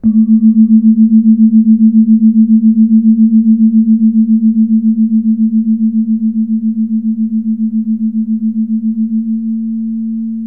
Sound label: jegogan, gamelan; gravityharps